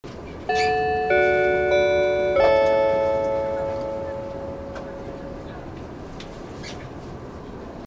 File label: chime; odakyudentetsu